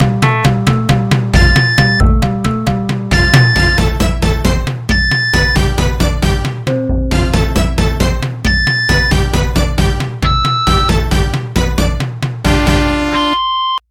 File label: ERROR